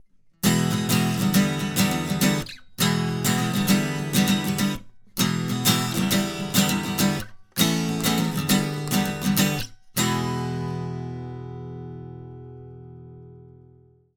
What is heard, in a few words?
Portico 5024 NT3 silk acc gtr